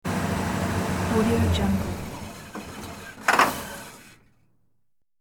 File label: car cough off and die